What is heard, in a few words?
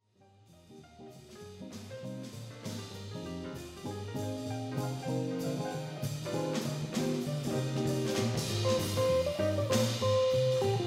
Jazz; Alumni